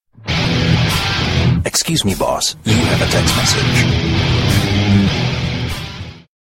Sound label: Metal Sms